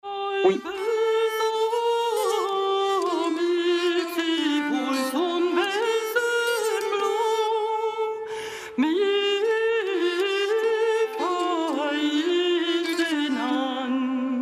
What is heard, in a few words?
chants trobairitz